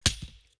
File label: pickaxe